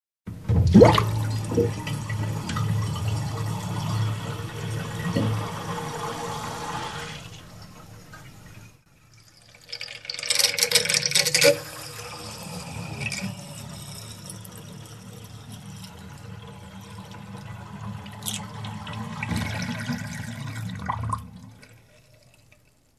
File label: Water drain in the sink